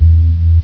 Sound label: space Bass